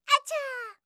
achaa; hidan

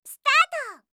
start

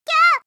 "kyaa"

